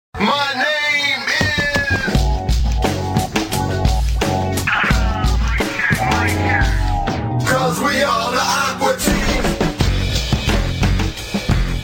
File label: Remix